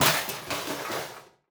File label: metal break